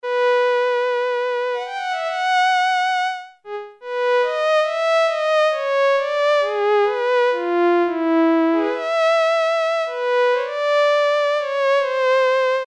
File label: Violin, Roland Juno-106; Factory Preset